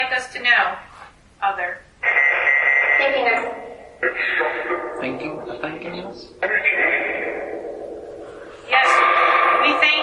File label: Phasma Box; 2nd Fl Eva Room; gill house investigation